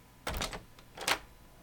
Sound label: Door open